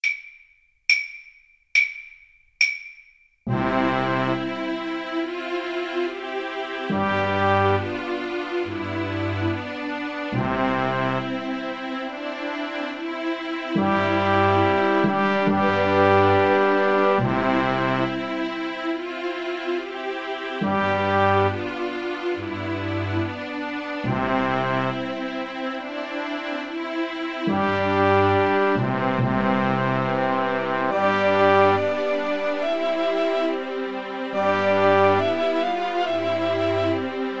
beethoven ode to joy

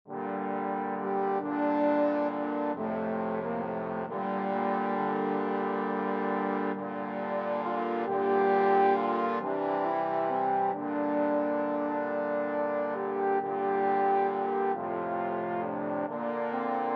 trombone quartet

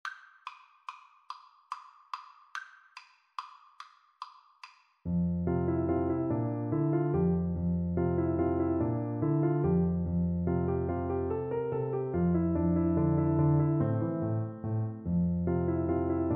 lakme, Tuba

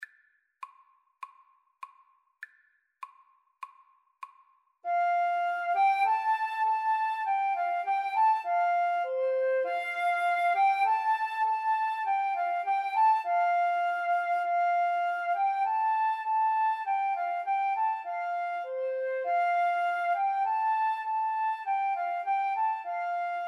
Flute Duet

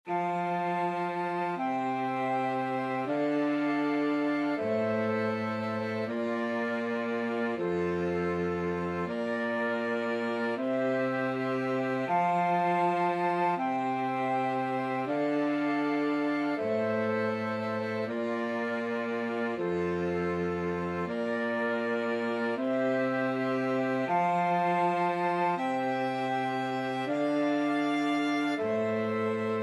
canon easy, flexible mixed ensemble 5 players

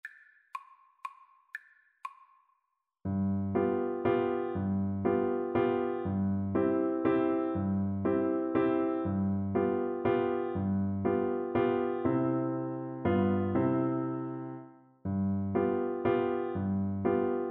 german dance, Flute

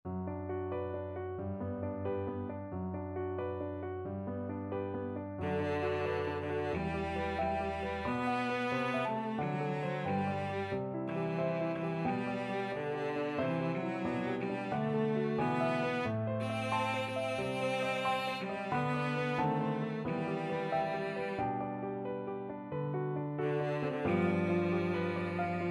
cello

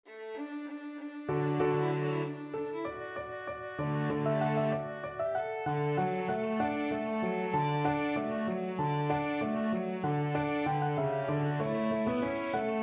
piano quartet; rejouissance

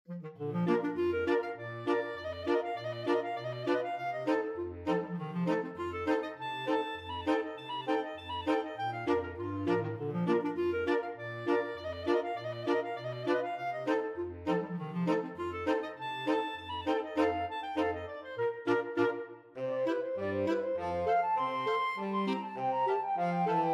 clarinet polka, flexible mixed ensemble 6 players

